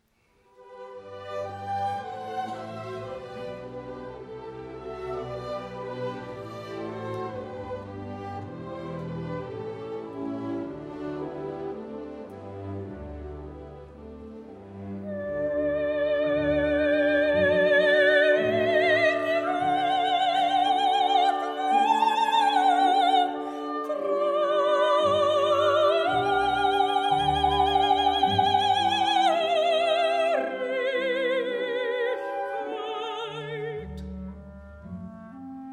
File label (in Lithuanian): Soprano arija; Brahms; Vikiskojo Requiem